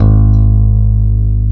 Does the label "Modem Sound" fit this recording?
No